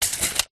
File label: industrial tape measure metal release retract loud tape noise; zapsplat